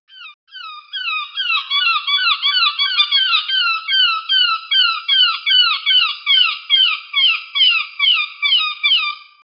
soft pleasant call of a, coastal

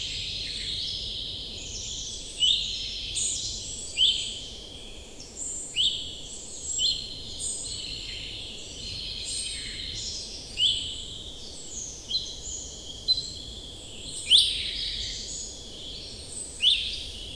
pure forest ambience early, early morning

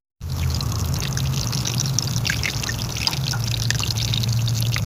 brief peaceful, garden

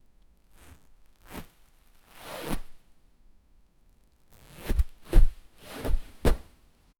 the sound of zipping up, clothing

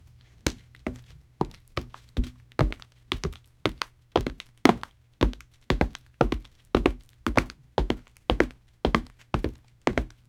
the person falls down to; falling

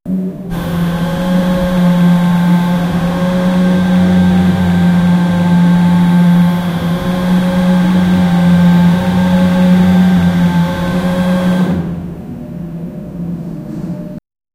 hospital respirator, healthcare

